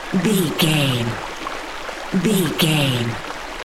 river stream night flowing water loop short version